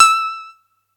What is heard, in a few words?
atari 520 st